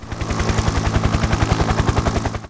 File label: heli pass; cstrike; ambience